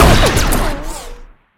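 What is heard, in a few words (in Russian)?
shot with explosive effect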